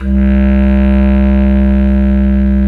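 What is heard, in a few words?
bass clarinet, Orchestral Winds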